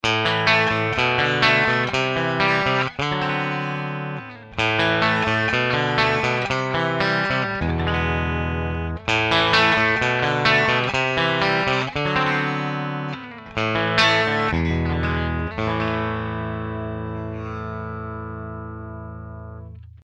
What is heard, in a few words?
steam hammer bridge clean; axetec